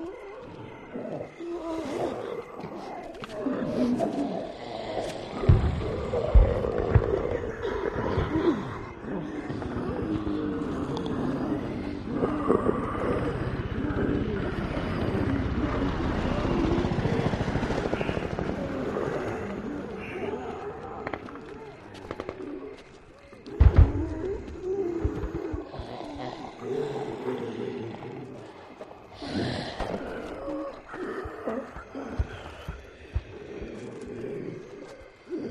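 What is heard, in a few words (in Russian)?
обстановка зомби на улице вдалеке стрельба и взрывы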